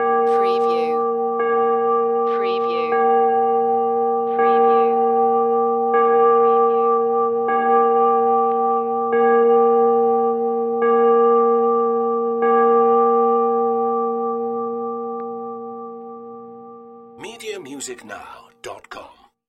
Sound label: Church Bell Chiming Continuous